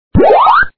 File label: pac powerup